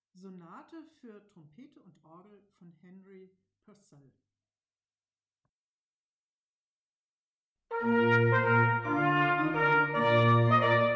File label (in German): sonate fuer trompete und orgel; henry purcell